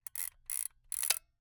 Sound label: Camera, Aimex SP500, point n shoot, plastic, wind film; Eiravaein Works; Vaeyan IV